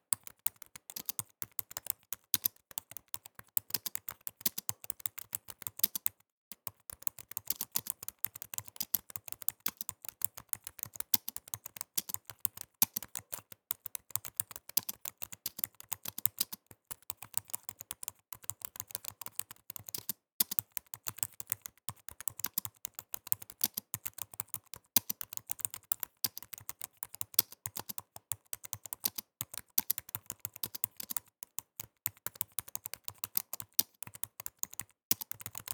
Quick imac keyboard typing sound

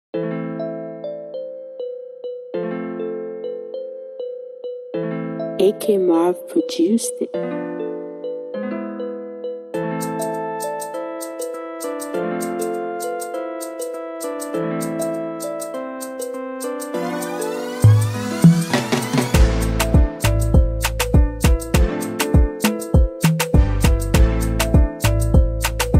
Afro Beat Instrumental

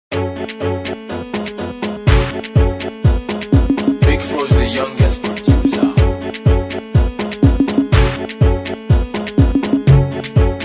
Instrumental

